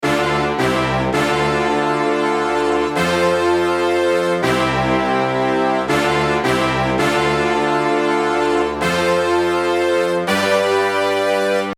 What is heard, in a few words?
lush horns